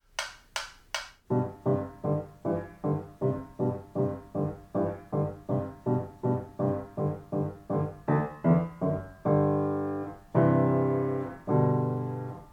Geburtstagslied Lehrerbegleitung PLAYALONG

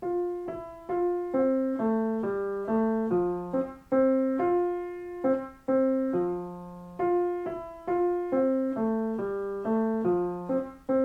Tischkanon Kanon PLAYALONG; klavierschule tastenmini band 1 notenstart

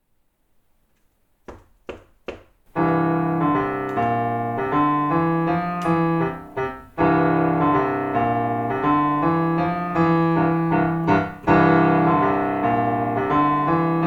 Begleitstimme

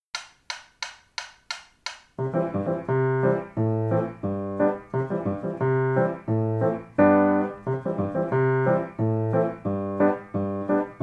Lehrerbegleitung playalong, piano

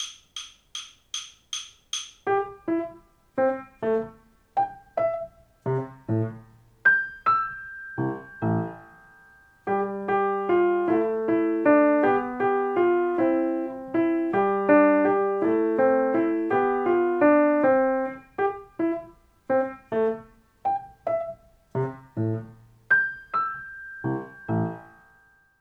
Freuhlingslied Secondo playalong

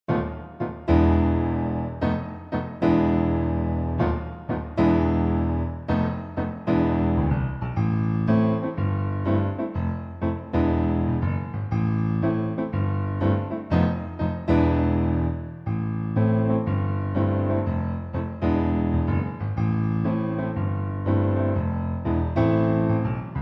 Krimi Lehrerstimme, Piano Duets; 3 konzertstuecke piano duets; pianodidact

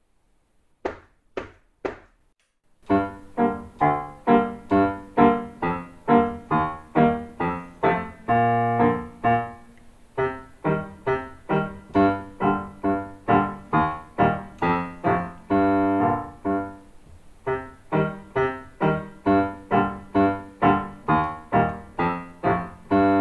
Begleitstimme

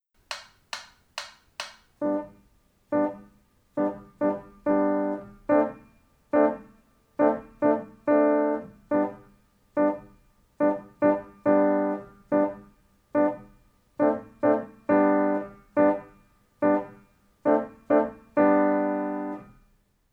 Lasst uns froh Secondo playalong

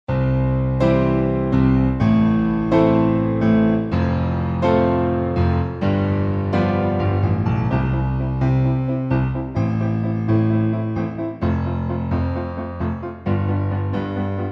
Pop Mini Concertino Lehrstimme, Pianos; 3 mini concertinos for 2 pianos; pianodidact

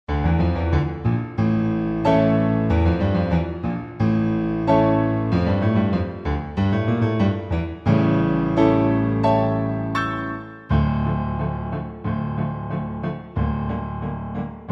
Lehrerstimme

